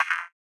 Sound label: Perc, Psytrance; Hats & Percs